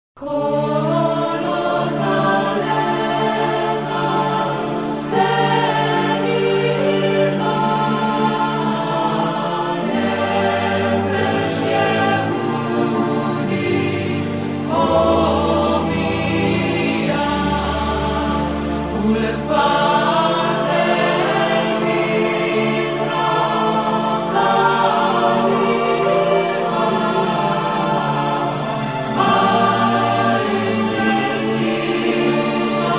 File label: Hatikva vocal; israel; anthem